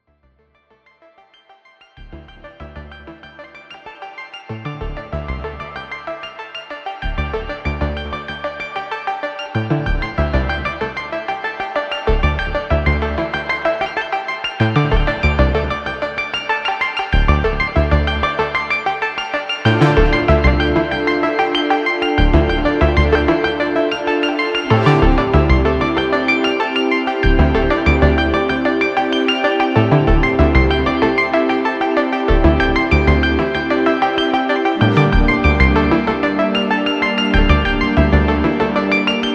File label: ARP-Scan